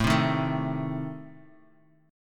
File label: A Diminished Bass Standard; Adim strummed; 17,15,13,14; JGuitar